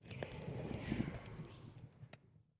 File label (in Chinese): 试炼刷怪笼环境声; ambient